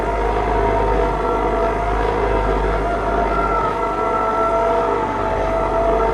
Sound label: Prop Warehouse Prop Rack Move Close; Titanfall 2; Boomtown